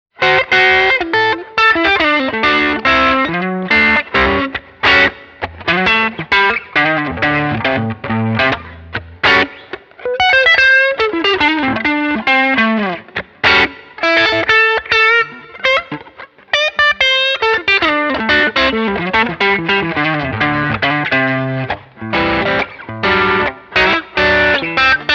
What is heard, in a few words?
Tubescreamer on; Screaming Blonde; DRIVE 9 h